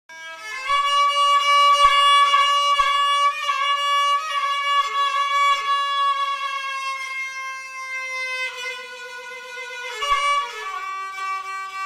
kabak kemane

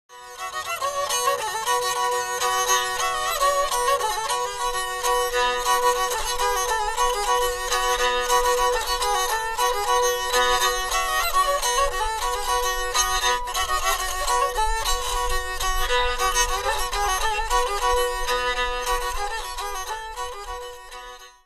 kemane

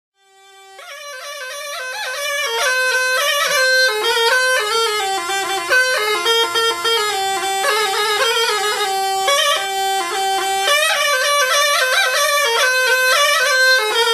sipsi

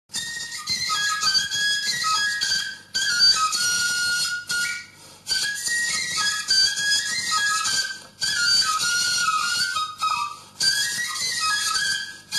kemik dudukcigirtma

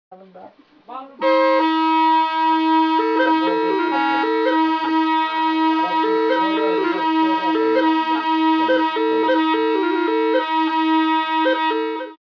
uyguncakli duduk

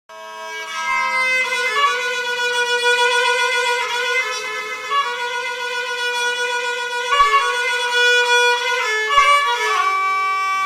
kabak kemane

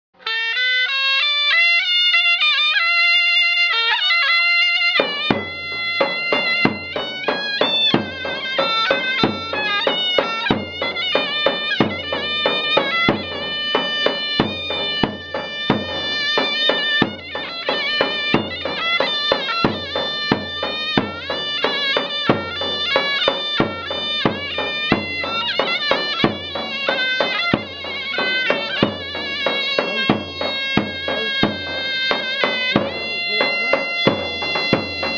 zurna

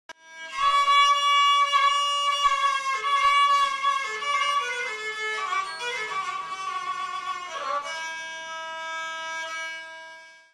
kabak kemane

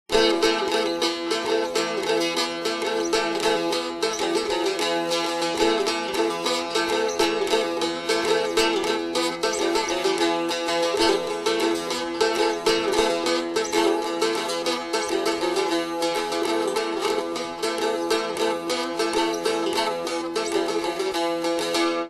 kabak saz

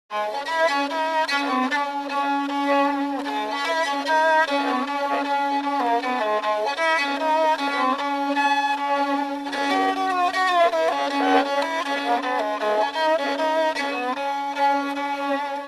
yörük kemanesi

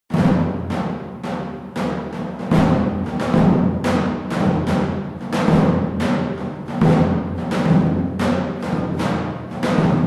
asma davul

